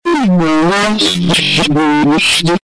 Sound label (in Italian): qui rido